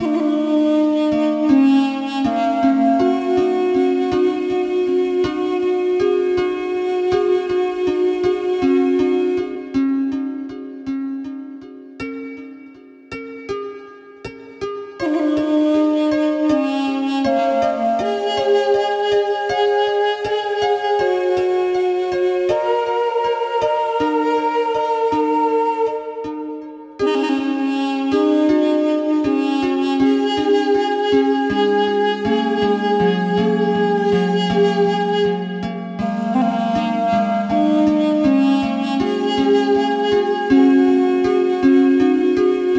duduk, 432 Hz

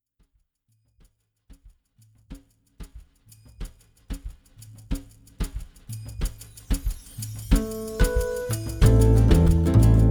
flamenco vibes 432 hz

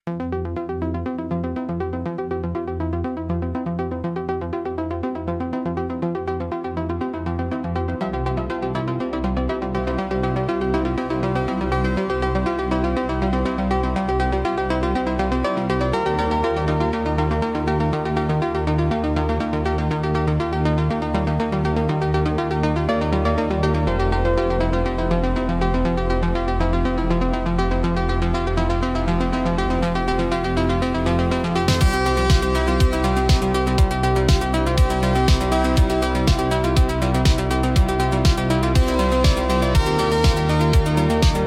Electronic Impulse

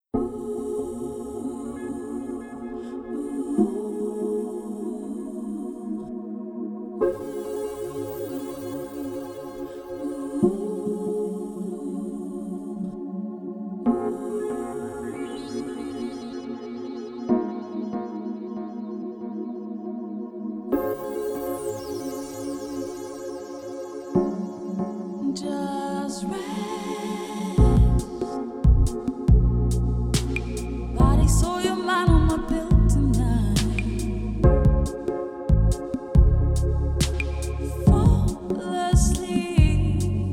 vocal chill, 432 hz

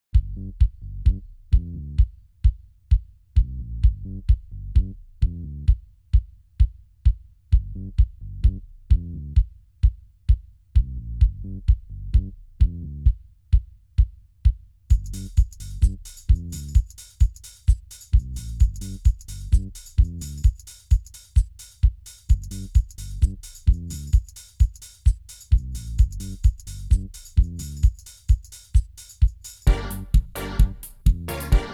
fitness music 432 Hz; 130 BPM

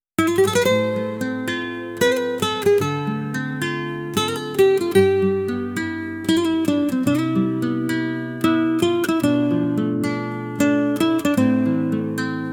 flaming strings, guitarra ardiente

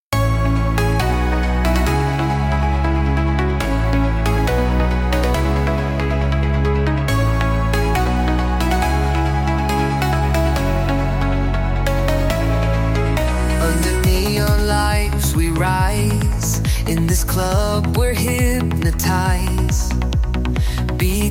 Trance warriors

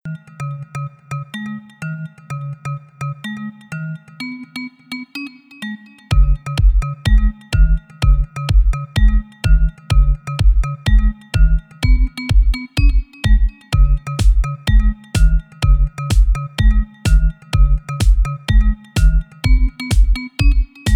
aerobic dance, 432 Hz; 126 BPM

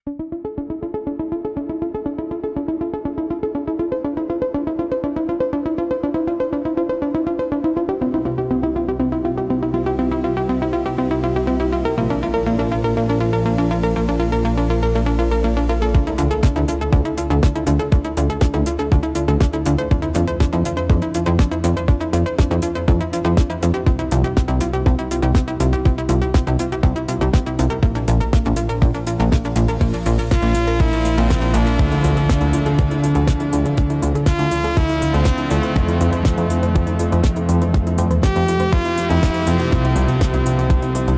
Electronic Impulse

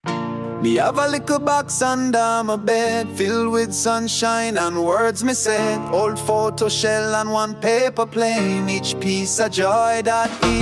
reggae

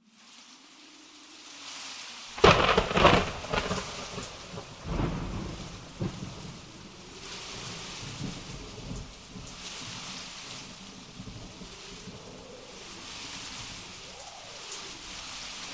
Music With Nature

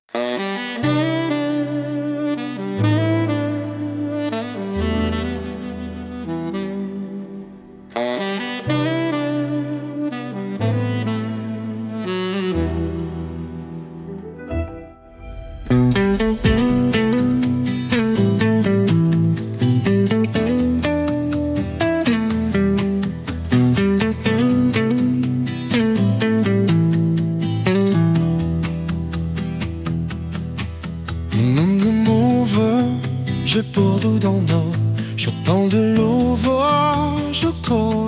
saxophone horizons

